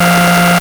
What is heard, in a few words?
LSDj, Nintendo Gameboy; 300 Drum Machines